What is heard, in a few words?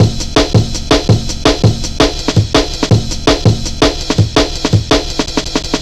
165, Drum Loops, Drum & Bass; Total Drum&Bass